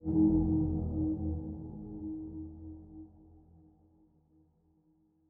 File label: menu exit Sys